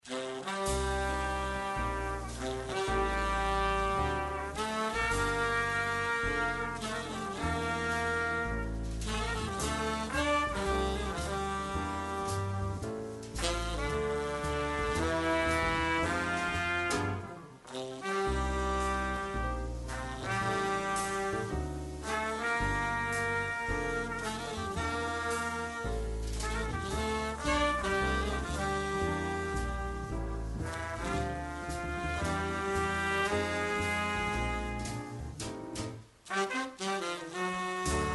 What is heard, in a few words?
Jazzgroup